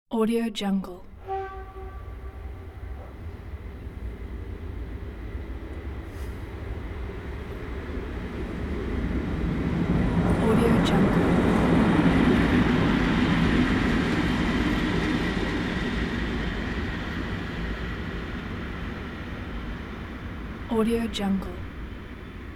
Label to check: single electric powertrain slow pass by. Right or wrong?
Right